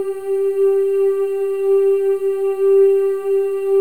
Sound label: FEM, Symphony of Voices; Spectrasonics